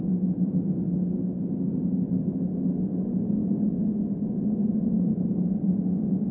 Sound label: tunnel wind loop; Source Engine; cstrike